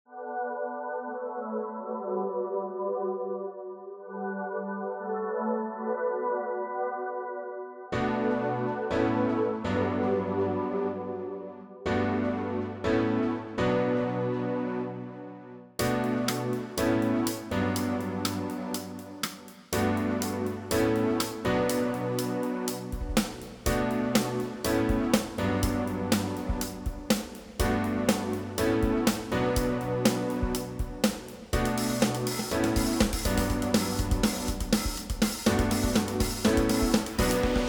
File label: City at night, bgm